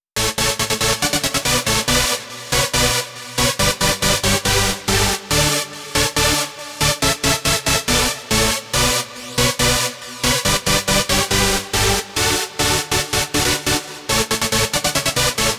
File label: Male Synth Lead, 140 BPM; Vengeance Samples; Vocal-Hook Kits; Song Kit